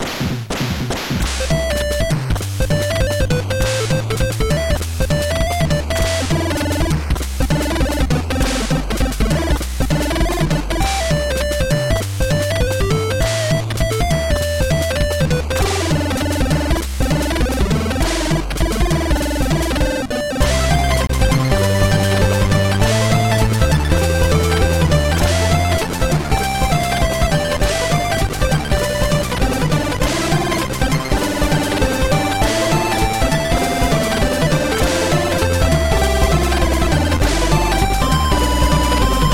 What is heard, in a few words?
Heartbass (NES); Friday Night Funkin' The Full Carol